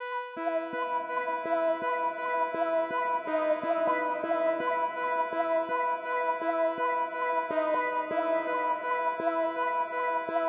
124 BPM C# Min Pluck; Melodies; Loop Stems; Cymatics; Cymatics - GHOST (Beta Pack); Come Back

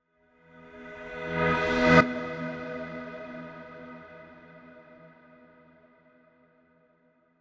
Riser

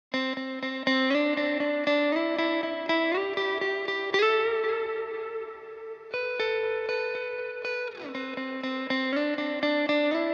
120 BPM A Min Backing Guitar; Loop Stems; Cymatics; Grief

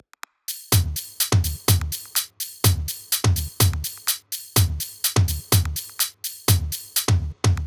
Bass House Kit, 125 bpm, Top; EDM Drums; Drum Kits; Stems; Ghosthack; Ultimate EDM Drums; Bass House Kit 10

